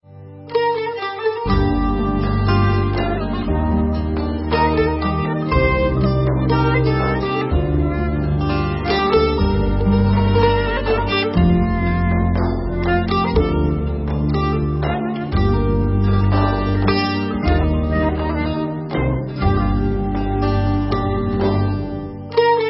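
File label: Instrumental, Israeli Dances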